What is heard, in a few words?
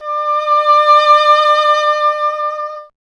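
English horn; Miroslav Vitous - Solo Instruments VOL-2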